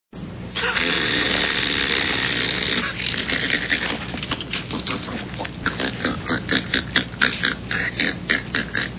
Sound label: laughter; enemy mine